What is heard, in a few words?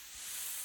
Air Brush Bursts Var Long; Electa Quinney